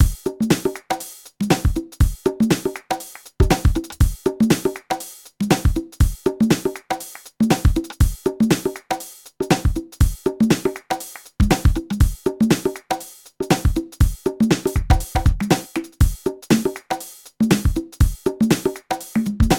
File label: Speedy Service